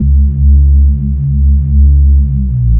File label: reese